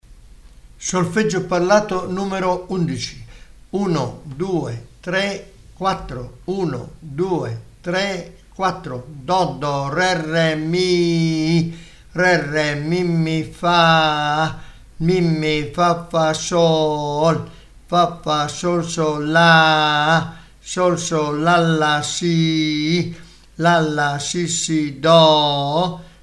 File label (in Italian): Solfeggio Il mio amico flauto